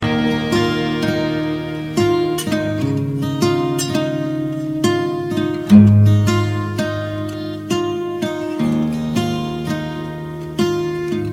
Sms

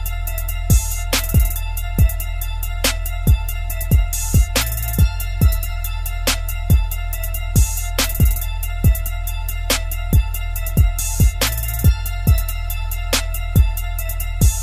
Hip Hop

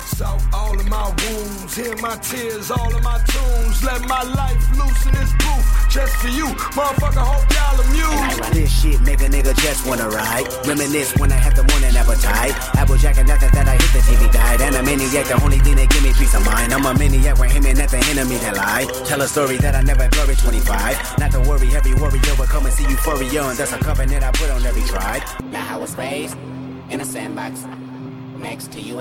Cartoon Cereal

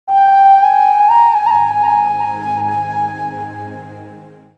Pan flute

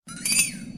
Message Tone

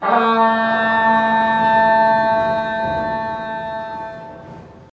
Horn; Lothlorien; LOTR

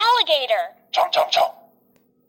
Alligator Chomp

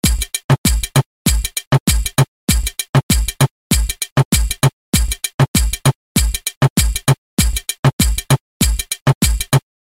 Reggaeton

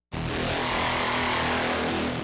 Sms; Ghost Recon Fs

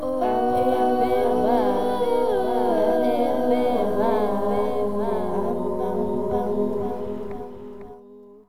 Woah Oh Oh Woah

